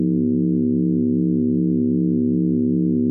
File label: Run new